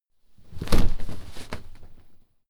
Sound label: clothes hit drop hard